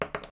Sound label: dice